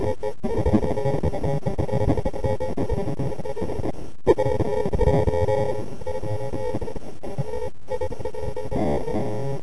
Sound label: noiz